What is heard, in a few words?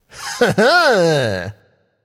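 "heh heh", nonverbal; smite; voicepack; khepri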